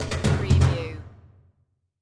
Musical

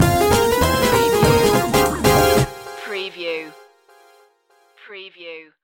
retro video game sweet action stinger

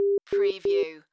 Film Slate Three Beeps

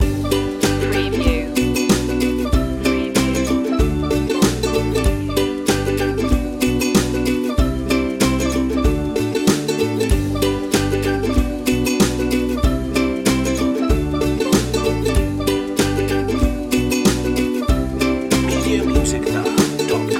caribbean joy loop

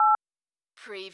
dialtone

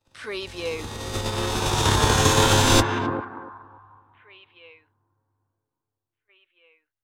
futuristic sizzle riser

